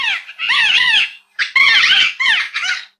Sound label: Parrot Talk; Parrot Voice; Fun content